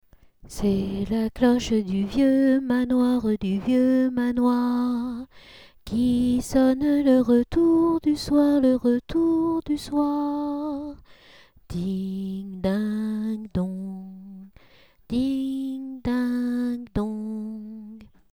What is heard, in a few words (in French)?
cloche vieux manoir